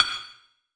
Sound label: Metalic, One Shot Drums; Sonic Foundry (Sony Creative Software); Knutrix Drum & Bass